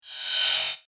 Space SciFi Robot Digital Swish Machine Whoosh Spaceship Transformer; Sound Design; Science Fiction Sound Effects